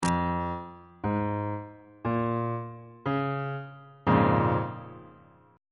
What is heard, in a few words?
a sharp minor 7th 2nd inversion